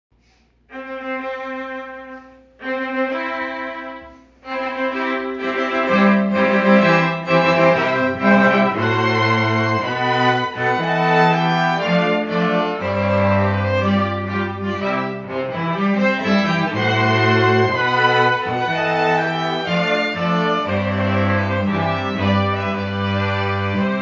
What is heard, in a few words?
Wedding March